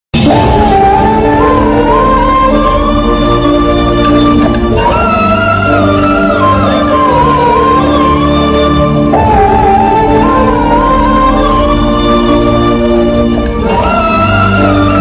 love bgm ringtone south indian bgm ringtone tamil ringtone